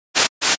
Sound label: cloth swipe